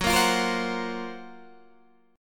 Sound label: Gb Major Sharp 11th, Guitar DADGAD; GbM#11 strummed